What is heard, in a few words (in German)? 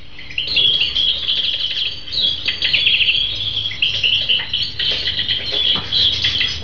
Vogel